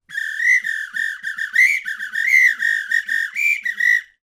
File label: apito